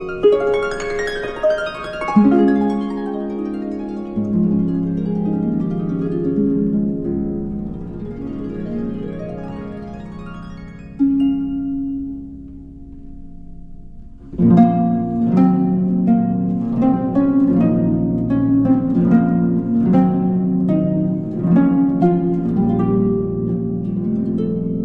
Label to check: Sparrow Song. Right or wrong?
Wrong